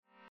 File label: click